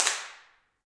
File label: PRC HNDCL, Clap; Rhythm Section; Clap & Snap; Clap Modules